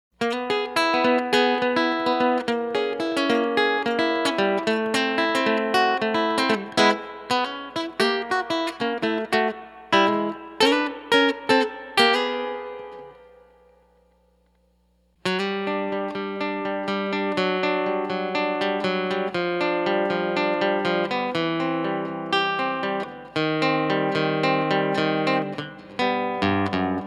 Nylon, Allen Chihuahua Amp

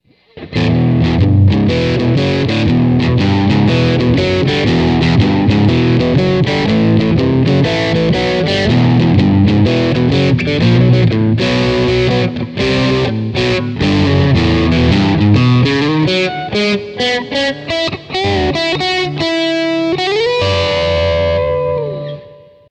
HEAVEY 5150, Boss GT-8

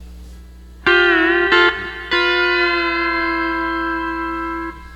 12 String Lap

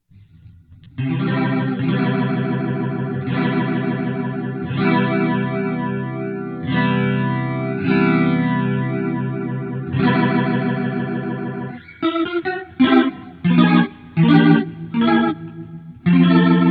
Crunch Rotary, Boss GT-8

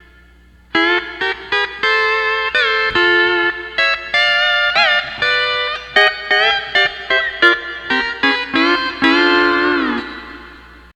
12 String Lap

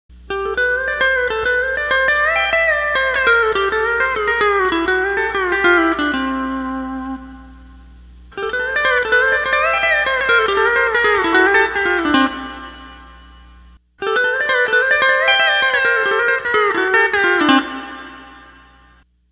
Pick Block

